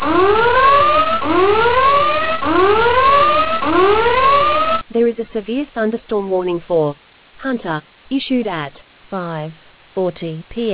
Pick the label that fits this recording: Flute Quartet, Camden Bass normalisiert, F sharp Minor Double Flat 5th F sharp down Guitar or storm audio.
storm audio